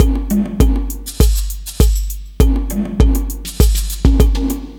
hippo beat, 100bpm

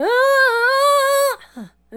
Adlib, Hip-Hop and RnB Vocals

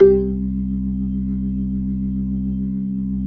B-3; Jazz; Roland LCDP10 Keys of the 60s and 70s 2

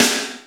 DRM ROCKER, Analog Drums; Roland L-CD701

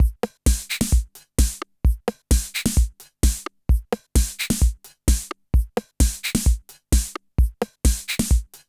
techno; INIS

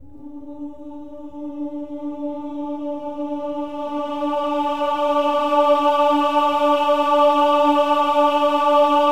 OH-AH D4, choir; Spectrasonics; Symphony of Voices

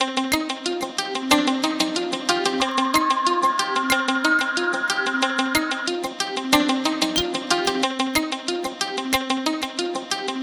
Melody, Hip-Hop

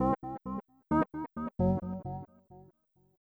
Organ Riff C-Eb-Gb, Old Skool House, 132bpm; Big Fish Audio; Marshmellow; Construction Kits

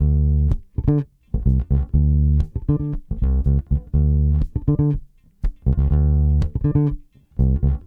Bass, 70s Analog Funk

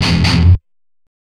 Riff, Industrial; Nasty

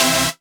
Synths Root C; Synth Shots and Basses; Vengeance - Essential Clubsounds Vol.4; Note C

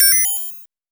MOOG HAT; AMG - Vince Clarke Lucky Bastard

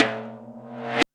REV TOM, ST.REV.TOMS; Back In Time Records; Big Bang

